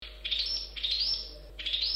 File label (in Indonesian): varian lagu burung cendet